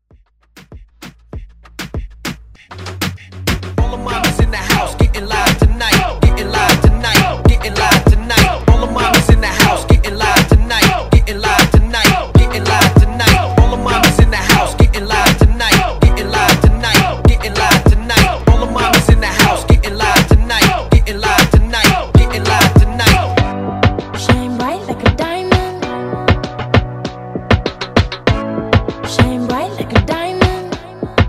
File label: Reggaeton Hype